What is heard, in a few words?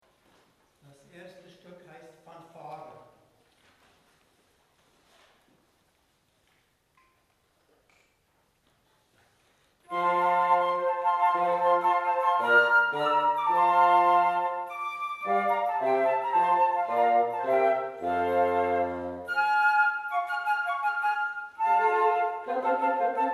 Fanfare